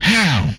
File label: Attack, Vocal; MMRetroArcadeSoundsPack